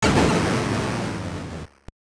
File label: Huge enemy destroyed; Gradius; MSX; enhanced SCC